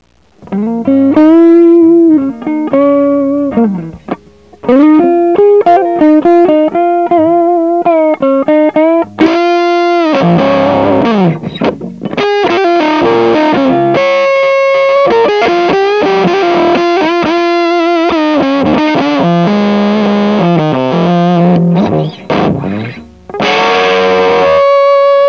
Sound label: mosrite fuzzrite